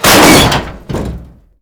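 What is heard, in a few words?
debris metal medium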